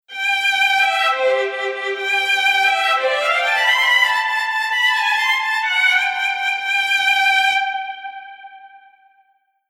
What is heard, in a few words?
Strings Layer Track